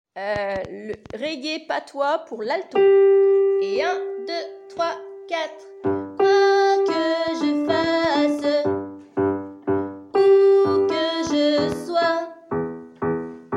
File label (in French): reggae alto